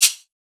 perc blue shaker, Drum One Shots; SD MIDNIGHT Sample Pack; Slate

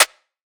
snare crisp; Hip-Hop; Drums; Drum One Shots; Slate; CAICOS Hip-Hop Sample Pack

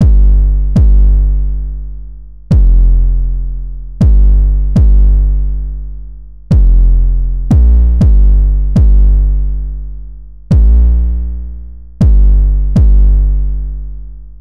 808 loop 120 Gm; Slate Digital BASSHOLE Sample Pack

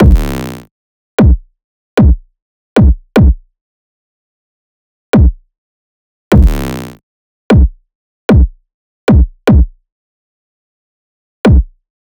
kick 152, Kick Loops; drum sample pack; SEISMIC; Slate

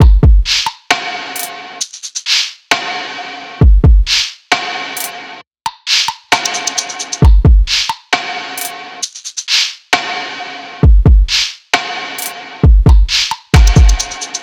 drums Visoke 133, Full Drum Loops; drum sample pack; SEISMIC; Slate

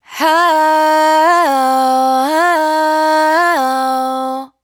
riff How Fm, Vocal, Dry Vocals; Raw Vocals; Slate Digital Omnivox Vocal Sample Pack

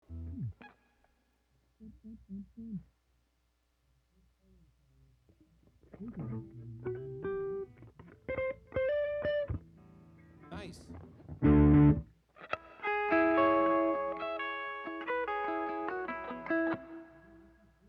Strings Emphasis Mix

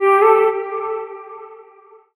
vocal electric Am; SD AURORA Sample Pack; Slate

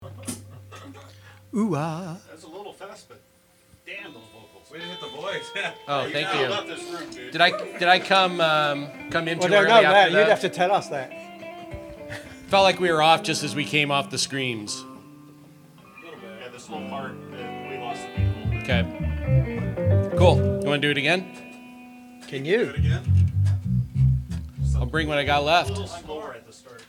Vocals and Background

